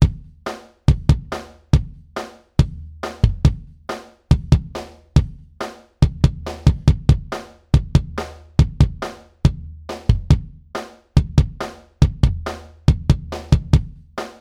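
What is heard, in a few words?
verse kick both mics processed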